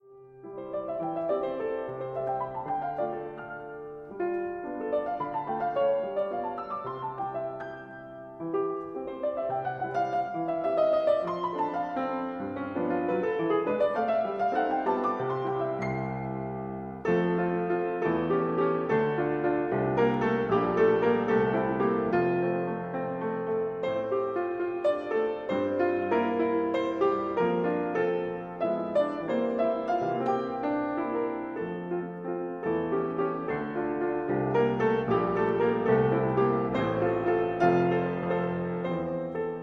valse des edelweiss pour piano; albert cahen d'anvers

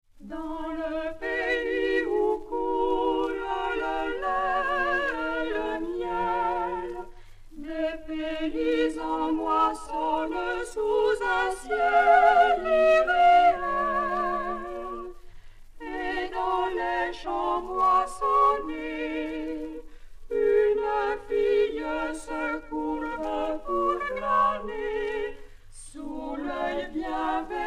ruth ensemble vocal; scouts de france

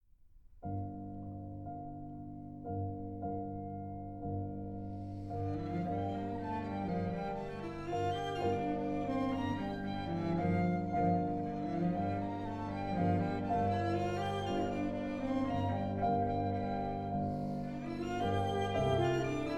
trio sur des melodies populaires

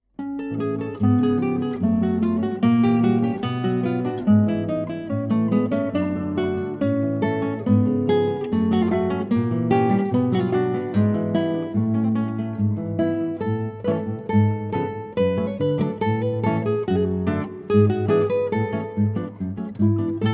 Suite sefarade pour deux guitares Cantiga

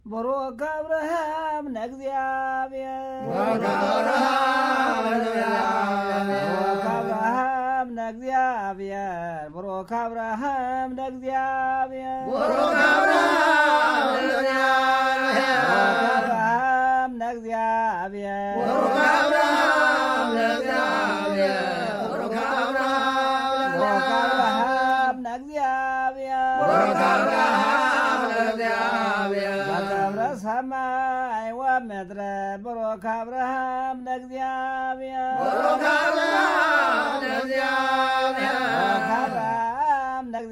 chants pour la circoncision

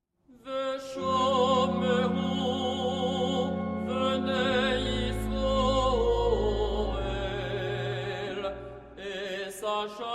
chants liturgiques hebraiques; naumbourg; veshomerou

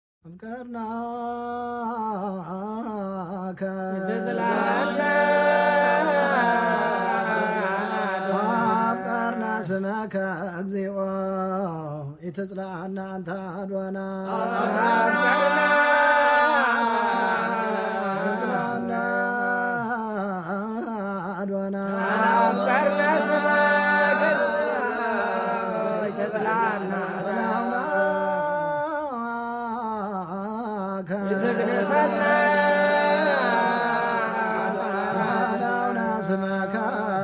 afqernaka chant h miolique

